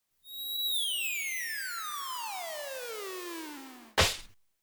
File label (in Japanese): 落ちる音とはりせん; 耳物語